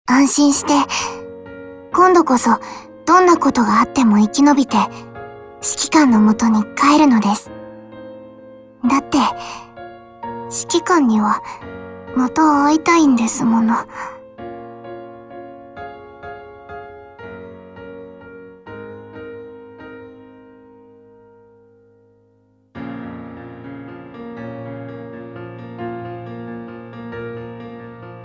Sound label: applause; AL Ayanami by [Winter]